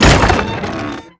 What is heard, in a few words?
item box open; objects